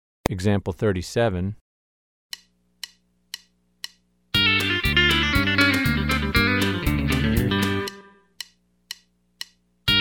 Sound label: Country Rock